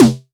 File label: SD, Waldorf Blofeld